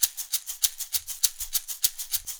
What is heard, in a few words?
100 shaker; Zero-G; Monster Beats